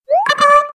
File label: Alert Sound